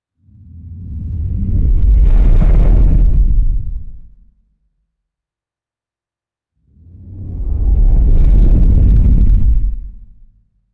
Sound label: tonal sci fi energy whoosh pass by; magic-scifi